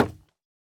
竹木破坏声

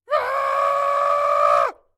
山羊尖叫声

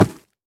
猪灵脚步声

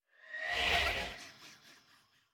恼鬼待机声

